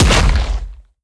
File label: step detail, strider; creatures; Half Life Alyx